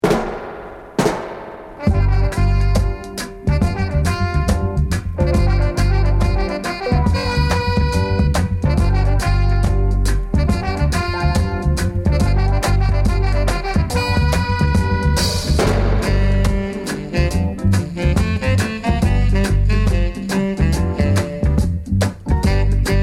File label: Funky Reggae